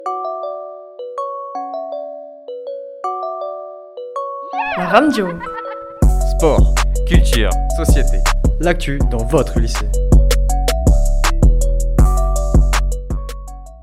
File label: jingle